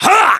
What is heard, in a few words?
kiai; MonsterHunter; Fight